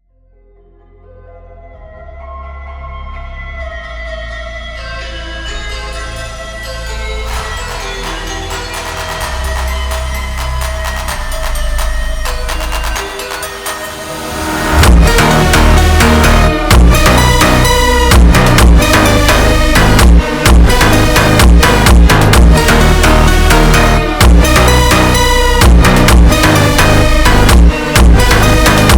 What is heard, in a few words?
Brazilian Phonk